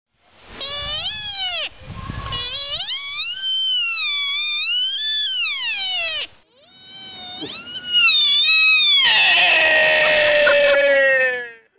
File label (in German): hirsch, Zootiere; zootiere erkennen